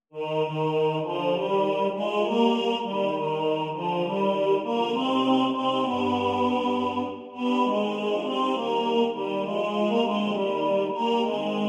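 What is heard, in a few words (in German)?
Tenor2 im Vordergrund, Männerchor; In jedem vollen Glase Wein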